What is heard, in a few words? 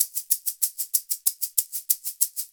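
shaker egg double, percussion; ILIO; Synclavier Percussion 2; World & Orchestral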